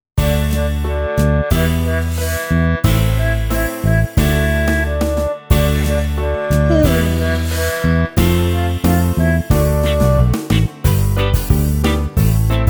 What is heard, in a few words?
Karaoke